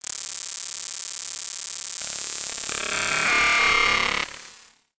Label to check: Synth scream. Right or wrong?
Wrong